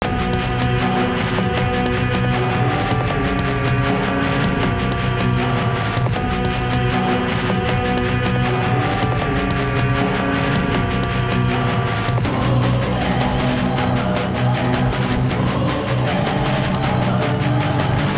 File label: Music combat